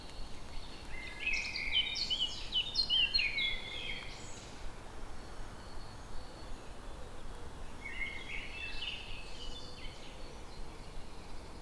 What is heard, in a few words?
Good Morning With The Bird Choir